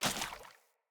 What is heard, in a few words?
water step; Characters; Ambient; Sprout Farmers